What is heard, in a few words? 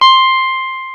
Jazz Guitar